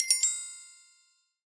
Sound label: surface chime, notifications; Microsoft Surface Duo 2